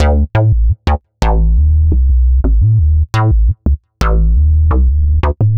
Synth Bass

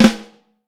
flam, Vintage Snare Drum

